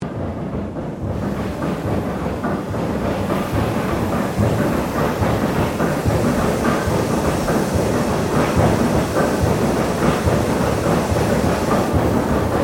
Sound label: Battery Steam Driven 10 Head Steam Release; Battery Steam Driven 10 Head 1860; Colonial era objects; Machinery; Machines